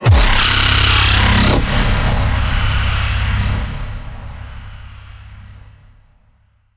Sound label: Low Cinematic Impact